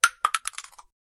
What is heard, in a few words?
casings